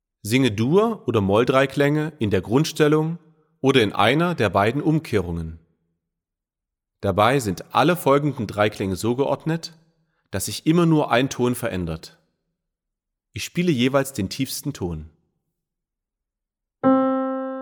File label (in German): dreiklangsumkehrungen in dur und moll singen uebung